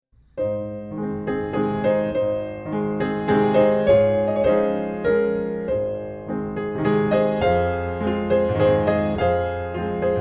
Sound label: Waltz; Brahms; Op39 No15